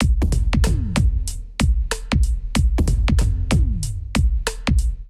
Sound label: 709 Kit groove 94bpm, Drum Loops; Ableton; Singularities